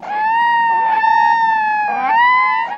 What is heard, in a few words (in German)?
HUNDE; ATHMOSPHERE